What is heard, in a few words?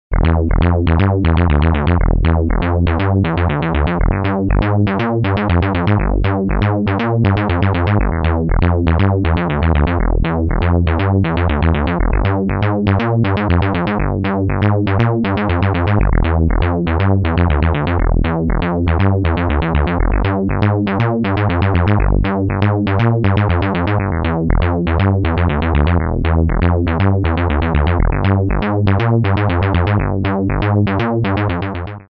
Sequ Bass